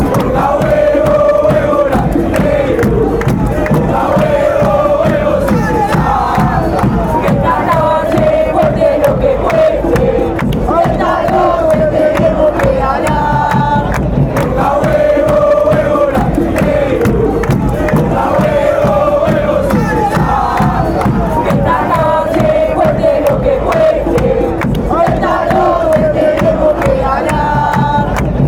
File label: put more balls into it, chants